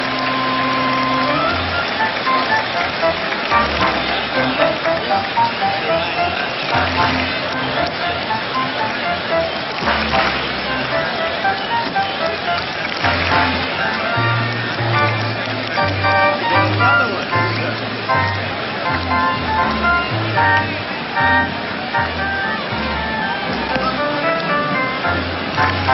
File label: music bed; sounder